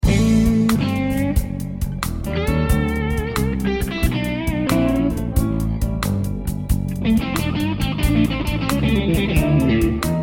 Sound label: blues